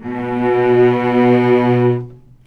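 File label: cellos attack B2 L, String; arco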